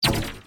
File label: fire; super space game